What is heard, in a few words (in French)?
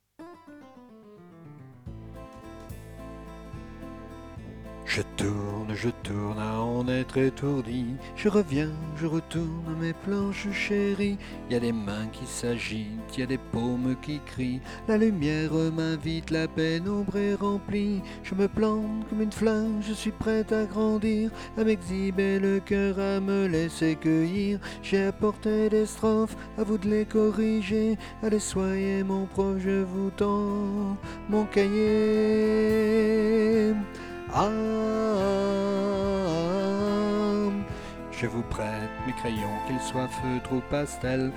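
Je tourne soprano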